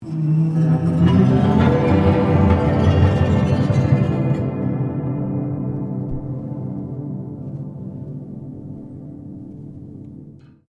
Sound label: PIANO UPRIGHT BROKEN STRING SLIDE UP SHIMMER, Upright piano without keyboard